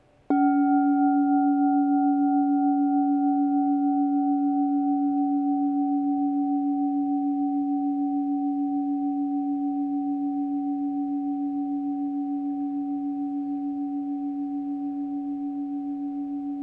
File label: chime